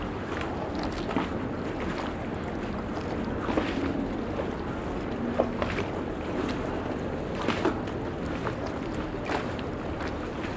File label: ambience canals water; Counter Strike Model Pack; CS:GO